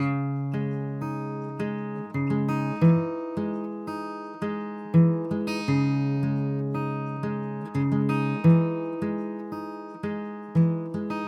085 Pop Ac-Guitar Arp C#Maj; Pop Rock; Acoustic Guitar; Pro Tools Essential Loops; Digidesign

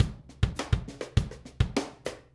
102 Rim Grooves, Drums; Pop Rock; Moderate Tempo; Pro Tools Essential Loops; Digidesign

